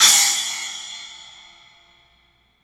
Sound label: 3D Percussives; E-MU Producer Series Vol. 5 – 3-D Audio Collection; P.A. Kit